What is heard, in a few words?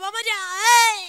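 rhythmic vocal; Best Service; World Colours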